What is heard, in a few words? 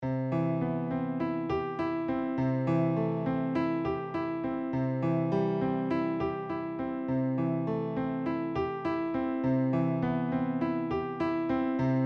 The Piano Serenade Groove; daily-groove